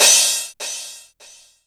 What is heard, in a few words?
crash, Drum Machines, Korg ER-1